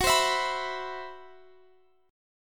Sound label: C Major 7th Flat 5th Gb, chord